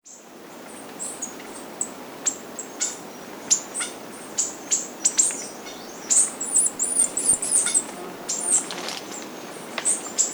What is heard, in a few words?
Cyanolyca armillata